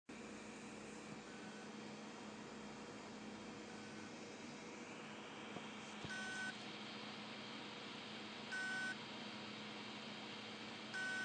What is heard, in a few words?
seagate stiction, damaged hdd sounds